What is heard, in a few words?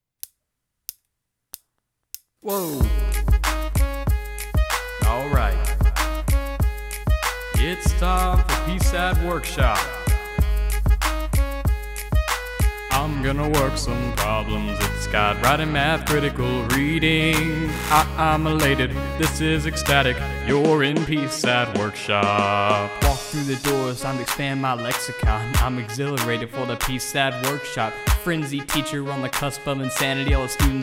PARODY RAP; Workshop